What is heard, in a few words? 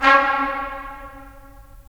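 brass trumpets staccato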